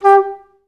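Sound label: alto flutes staccato G3; Best Service; Peter Siedlaczek's Advanced Orchestra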